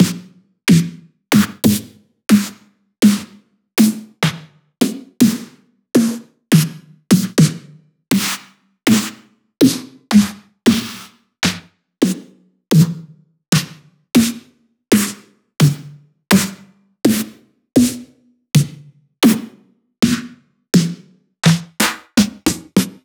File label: Snares Synth Snares; Ghosthack